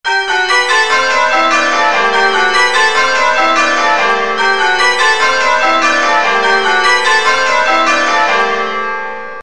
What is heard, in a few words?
Hagdyke, changeringing